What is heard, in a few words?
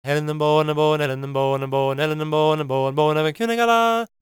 GAELIC, World Voices; ILIO; Vocal Planet